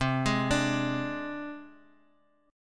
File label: C Suspended 2nd Sharp 5th C Guitar Open D; down; chord shape